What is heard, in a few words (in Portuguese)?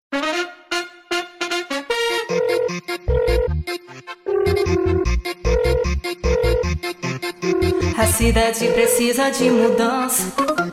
jingle